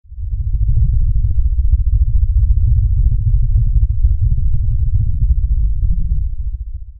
Quack Sound Effect

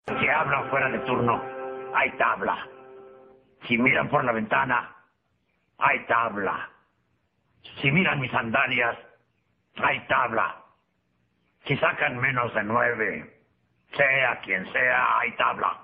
Tabla

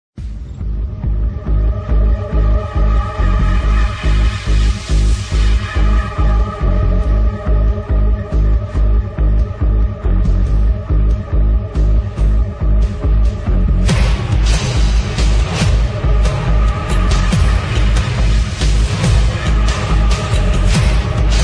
machine gun 16bit remix

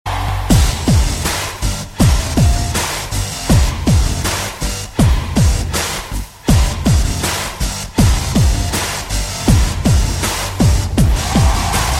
rocking music beat

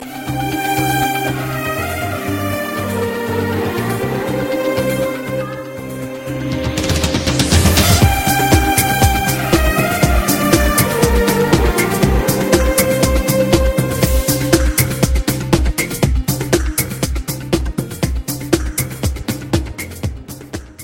bgm

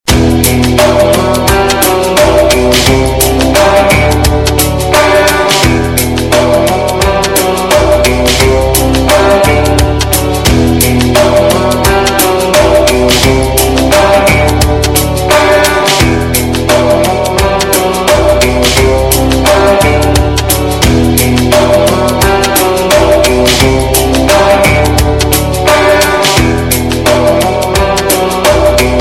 best ring tone

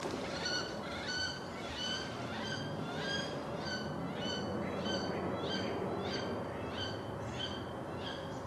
ducks squealers; animals

